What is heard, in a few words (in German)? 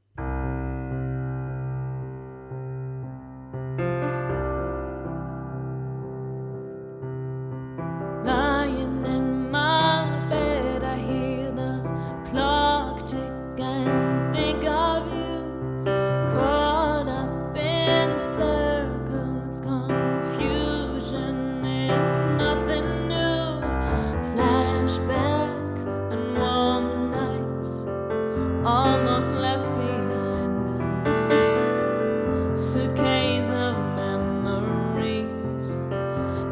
Loungeband Chill Piano Drums Gesang Band; ModernJukes; Hannover